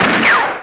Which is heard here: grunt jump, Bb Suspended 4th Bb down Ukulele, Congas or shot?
shot